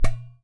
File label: GHATAM, DRUMS OF IND